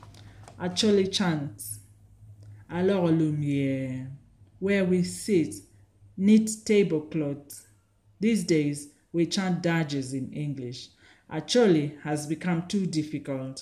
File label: Acoli Chants